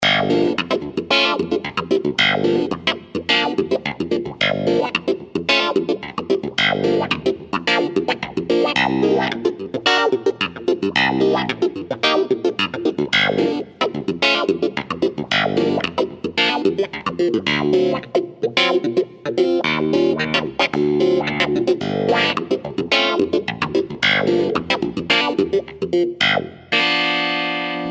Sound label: Hohner Clavinet D6